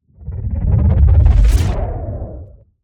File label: Whoosh; SoundBits; Whooshes & Impacts